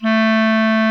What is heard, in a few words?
CLARINET A3, REEDS; Greytsounds VOL-1